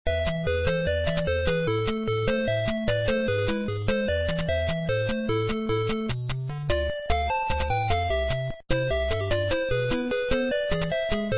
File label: music, tetris